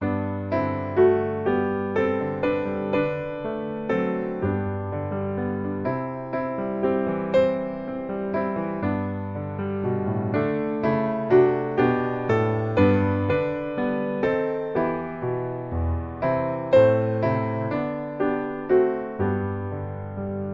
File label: piano